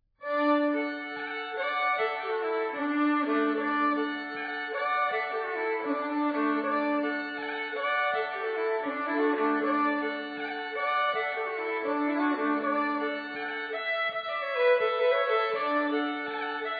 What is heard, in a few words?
Halling Fiddle; Nils Rekves; grieg